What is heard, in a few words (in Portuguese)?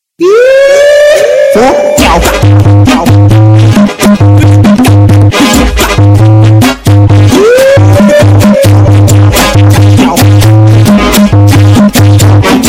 funk altomotivo, Músicas Com Graves